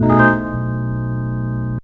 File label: ORG CHD; ORGAN; E-Lab; X Static Goldmine 2; akai